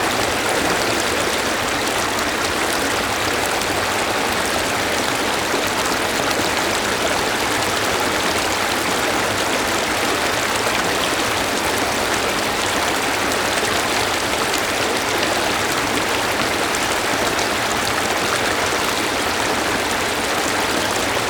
Dam, water, flowing, close, small, steady flow; Double Trouble Audio

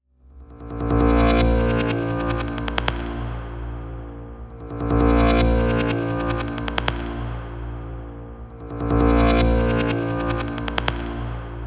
Drone (A#), Trailer; Federico Soler Fernandez; Effective Trailer Drones

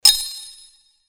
Sword Sound; FXHome; Fighting Sounds

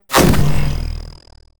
Weapon Layer FX, Future Melee Weapons; Audio Summoners

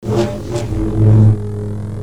Saber; FXHome

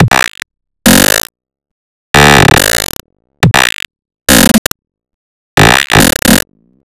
Loop, 140 bpm, Stutter Sounds; 99Sounds; Mangling Audio

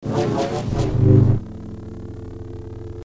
Double Bladed Saber Sound; FXHome

